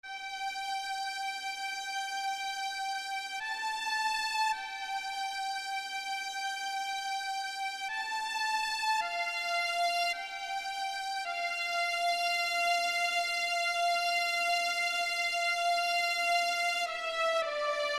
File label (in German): streicher